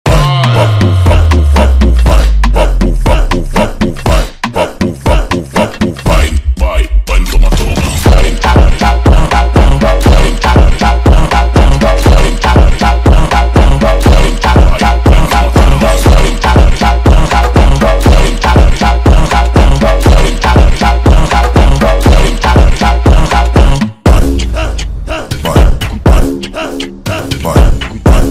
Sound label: Funk pump